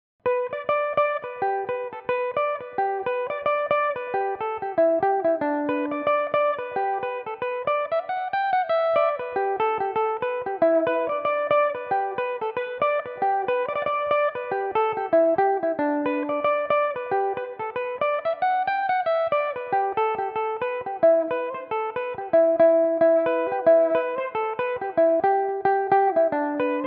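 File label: G major, Irish mandolin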